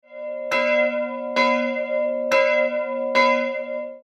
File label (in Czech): zvon